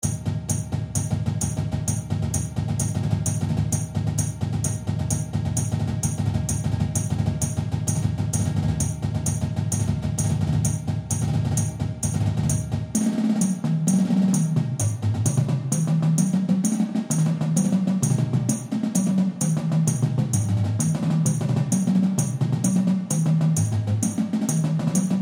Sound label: Rolls